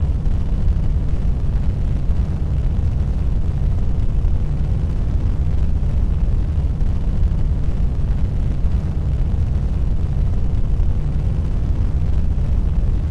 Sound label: spaceship thrusters